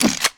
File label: smoke grenade; LoL; graves